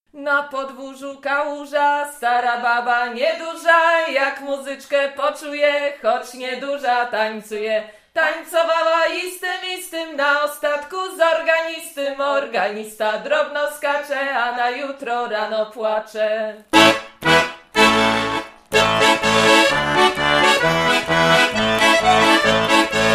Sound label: Polka Galopka